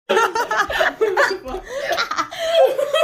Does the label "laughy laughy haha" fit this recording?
Yes